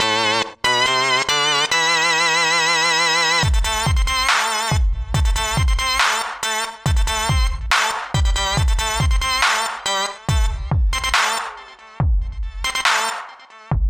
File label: HEAVY SLOW